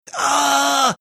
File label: frustrated ahhhhh